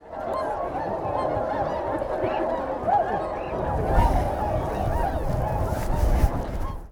kohakutyou, bird song; コハクチョウ; 鳴き声; 新潟県阿賀野市 瓢湖; J-eco 鳥のさえずり図鑑

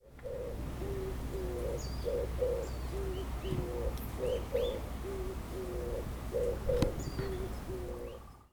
ryuukyuukizibato, bird song; リュキュウキジバト; 鳴き声; 沖縄県八重山郡竹富町 西表島 ミトレアキャンプ場

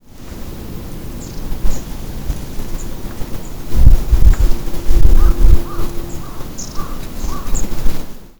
simaenaga, bird song; シマエナガ; 北海道苫小牧市植苗ウトナイ湖; J-eco 鳥のさえずり図鑑

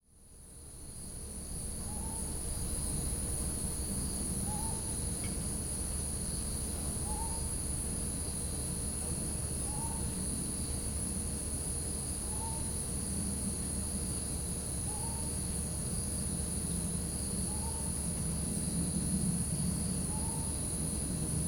ryuukyuukonohazuku, bird song; リュウキュウコノハズク; さえずり; 沖縄県国頭郡国頭村

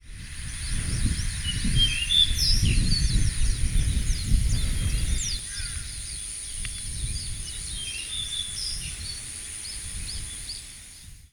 ryuukyuukibitaki, bird song; リュウキュウキビタキ; さえずり①; 沖縄県国頭郡国頭村

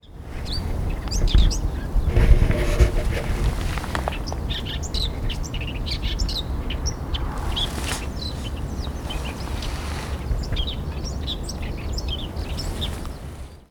mozu, bird song

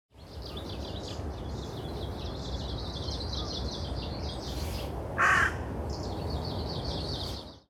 オオムシクイ さえずり; ムシクイ科; 新潟県新潟市中央区 鳥屋野潟公園; bird song

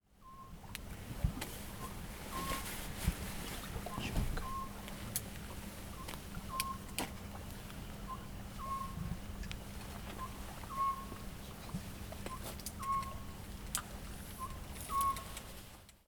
konohazuku, bird song; コノハズク; さえずり; フクロウ目; フクロウ科; 福島県只見町; J-eco 鳥のさえずり図鑑

